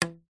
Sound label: foley pipe pvc plastic end hit ground